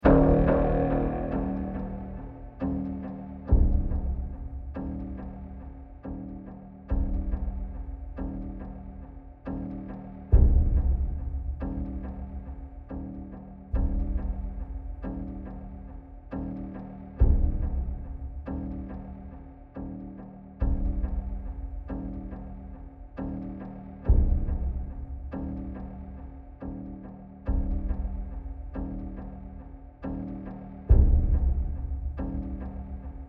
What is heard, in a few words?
Tension; Lab Tension After Stab